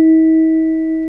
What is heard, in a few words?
fork real